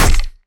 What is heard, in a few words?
hit enemy